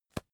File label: magazine tap